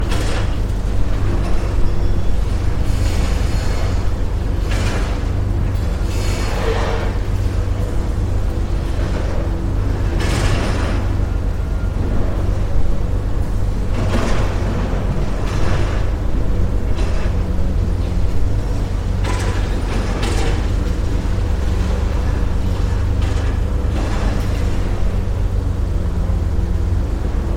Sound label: demolish building